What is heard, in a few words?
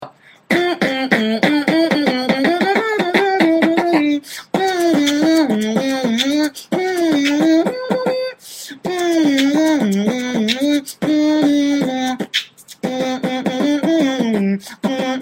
beatbox